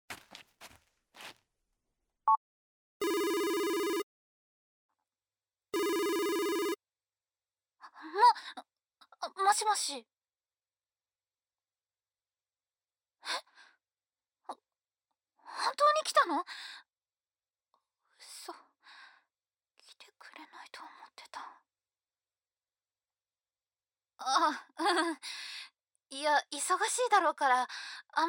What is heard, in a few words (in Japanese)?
ディープキス; 10年前の彼女と再開し